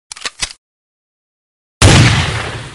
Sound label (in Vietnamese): Tiếng Bắn Súng